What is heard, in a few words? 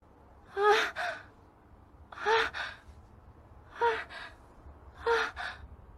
argh